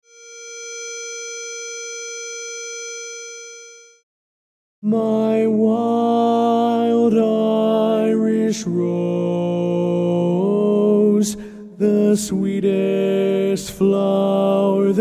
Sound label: Baritone